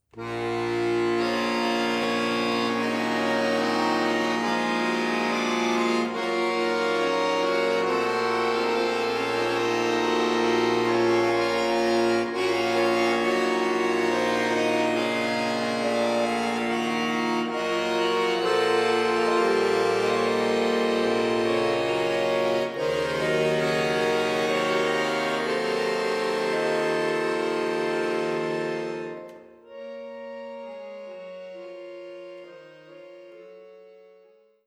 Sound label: accordion